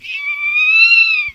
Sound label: Zone-tailed hawk